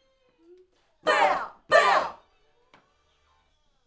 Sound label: Backs AKG